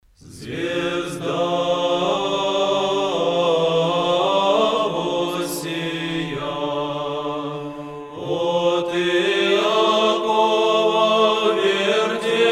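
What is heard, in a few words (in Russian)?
глас 6 киевский распев; zvezda vozsiya ot iakova v vertepe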